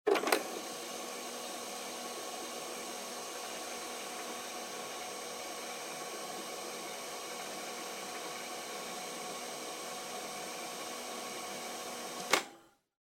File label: Portable Stereo Driving Noise Tape Play Stop